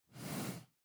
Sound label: sleeping; Rust